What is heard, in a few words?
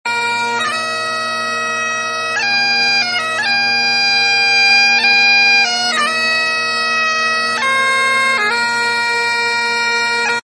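Irish Bagpipe; Sms Sound Effect; Ringtones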